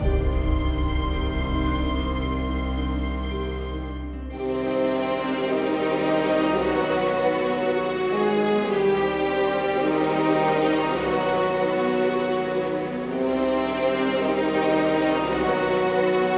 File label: bg music; atlas